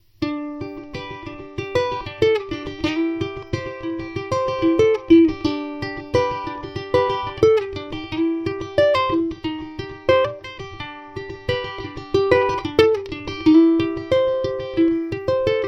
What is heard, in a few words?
Tenor G fingerpick